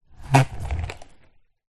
sport skateboard small plastic childs wheels scrape rub ground away